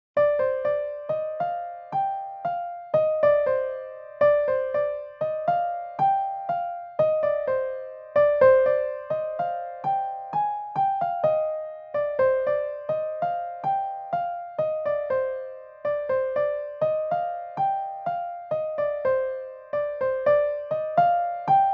piano version

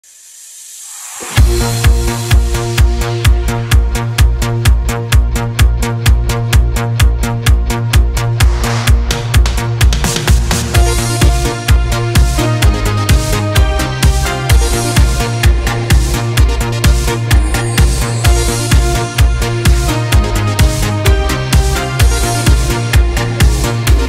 eurodance remix italo disco

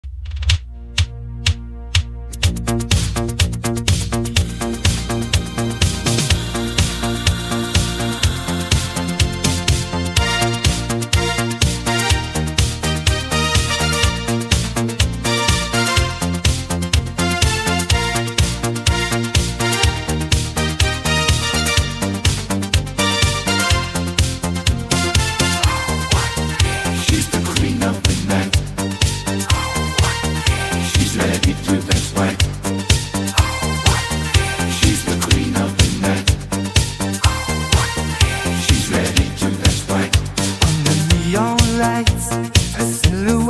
eurodisco

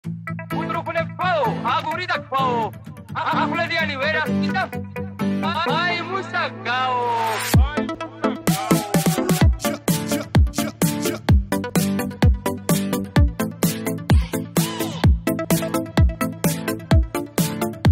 karaoke

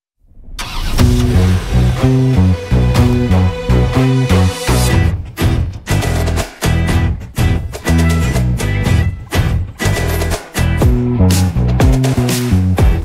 instrumental

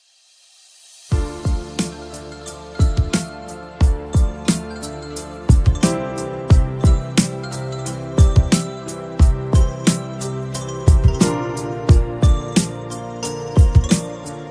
boom bap beat

